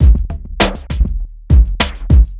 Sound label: drloop, drumloops, Vinyl Attack; Masterbits